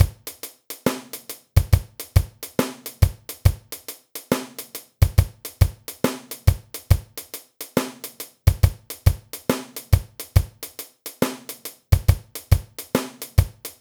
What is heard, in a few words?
Reggae Steady No Crash